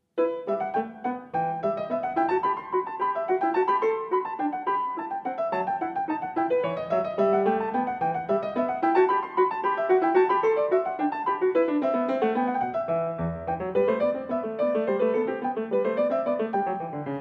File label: English Suite in E minor, Passepied I en Rondeau; English Suite No 5; BWV 810; Bach